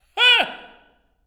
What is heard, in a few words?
LAUGH, OP. LAUGHS; Spectrasonics; Symphony of Voices